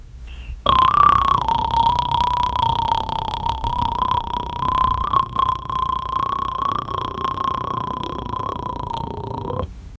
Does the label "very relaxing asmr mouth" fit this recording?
Yes